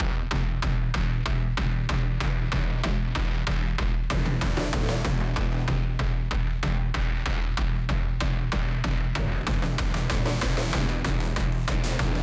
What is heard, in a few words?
on the brink, BPM 95 / Key F#; TinyBGM